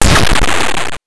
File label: randomize, 8 bits